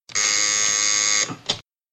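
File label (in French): sonnette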